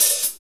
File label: HH, Roland; HH-Kits